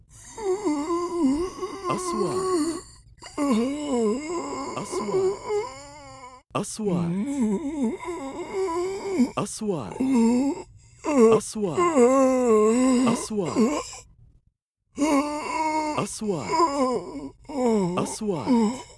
MUMMY SOUND STYLE

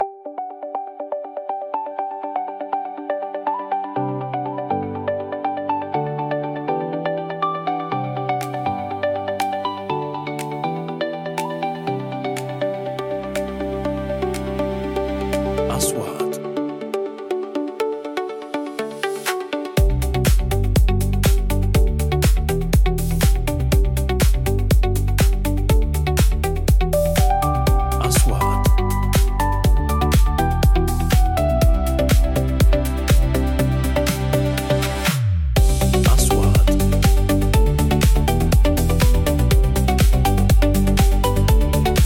corporate

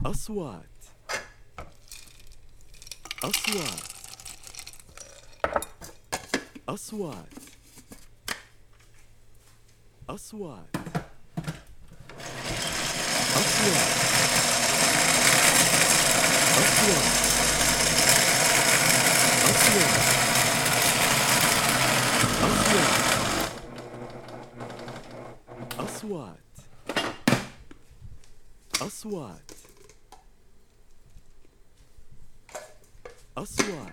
Mixer Kitchen

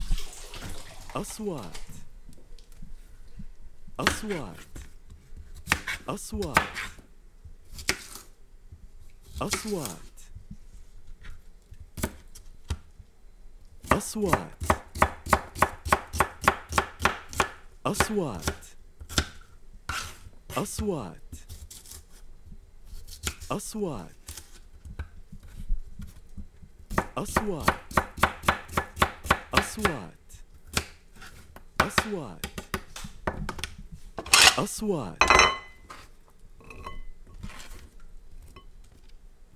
Slicing Fruits Vegetables; kitchen

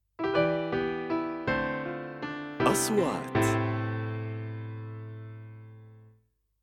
intro piano

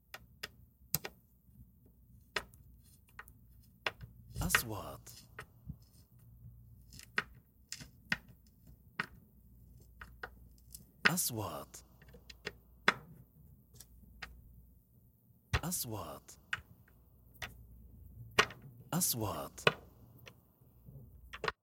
Wood

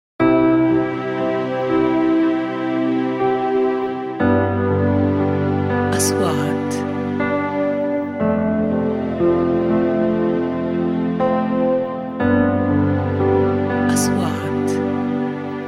advertisements

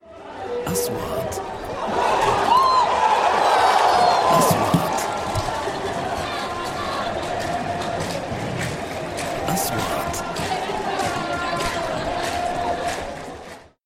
Applause for football lost a chance goal

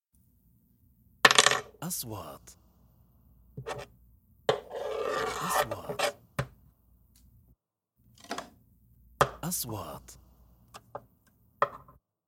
Wood

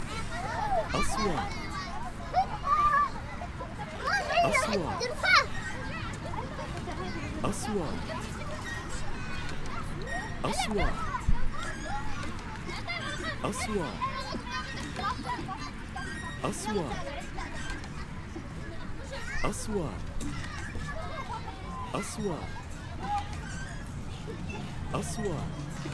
Kids Playing On the beach

